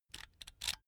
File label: electric drill template adjust regular; soundpool